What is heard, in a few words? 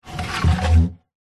wooden box crate lid slide open fast; foley; zapsplat